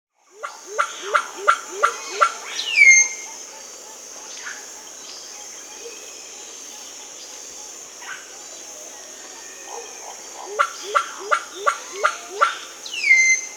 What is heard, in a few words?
Solitary Cacique (Cacicus solitarius), Peru, Birds of the Amazon; S. America